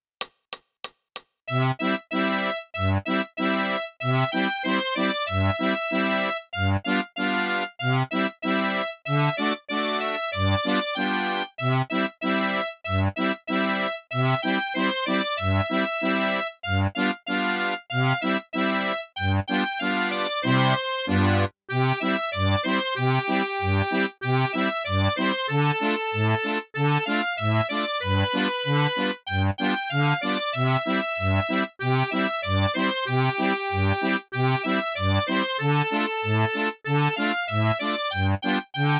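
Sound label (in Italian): Jingle bells, Fisarmonica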